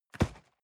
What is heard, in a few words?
cardboard box medium place rustle; objekte